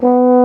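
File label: baritone B2 horn; InVision Interactive; Lightware VOL-1; Instruments & Percussions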